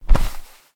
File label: Hug